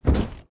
hipcutter foley walk

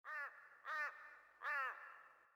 sting crow; Rust

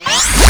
plasma shield on

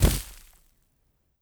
grass; Rust

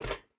footstep burlap metal run; Rust

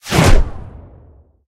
shell whiz

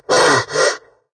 redout short; War Thunder

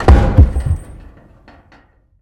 damage; World of Tanks

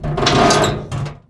objects, World of Tanks

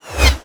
grenade whizzby

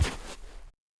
Animal Foot Large Snow; Civ4 warlords

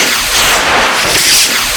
move 40mm proj loop

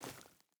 footstep burlap forest walk; Rust

